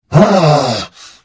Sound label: Hit; The Elder Scrolls III - Morrowind